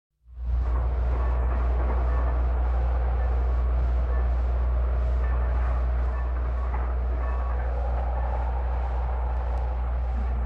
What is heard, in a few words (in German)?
dampflok illinois central; Bahnhof